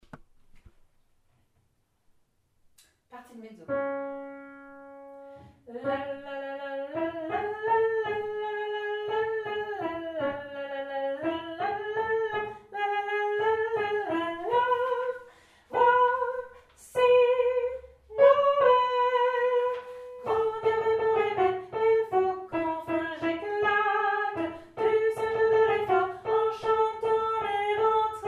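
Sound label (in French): Mezzo, Choeur; Grand Dieu, Ribon, Ribaine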